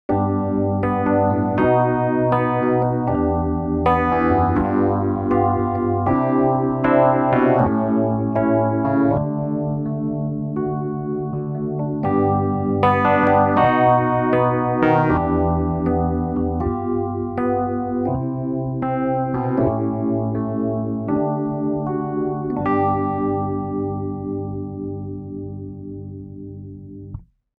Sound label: Rhodes V8 Default Phaser